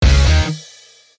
Quizz Correct Answer; Metal Gear Solid V Ground Zeroes; Deja Vu

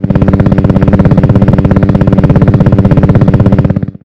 Propellerhat

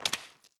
mud footstep; adams venture origins

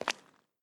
concrete wet footstep

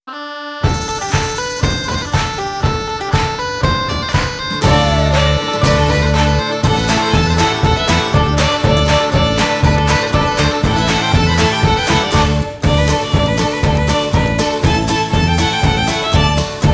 Victory Jiggy

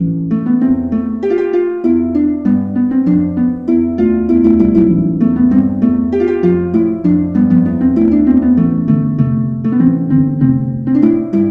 Harp

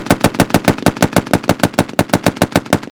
Gatling Gun Close; War Trains; 2019 gamerip

